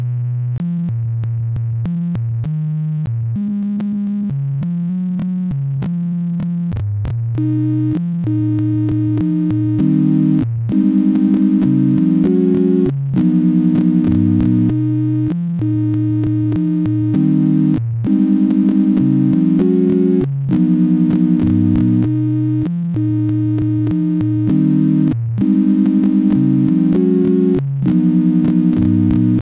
Sinewave

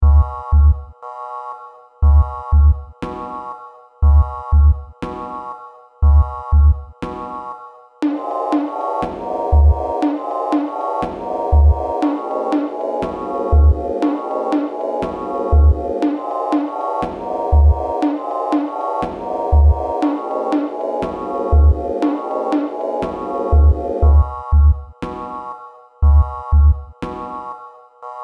Sine Wave

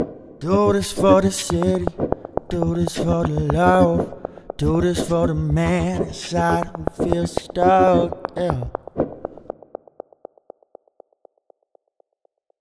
SIne

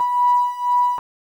Sinewave

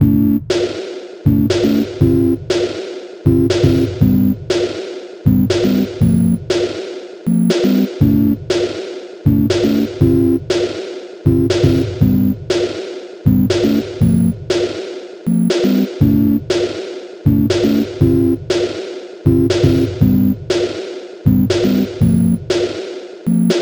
Sine Wave

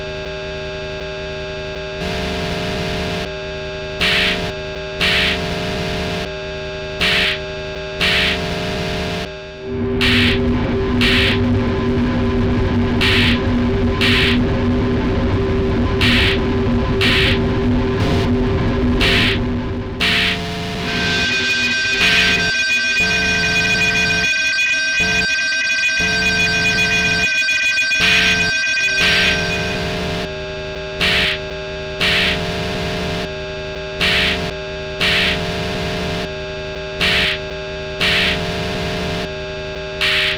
sinewave

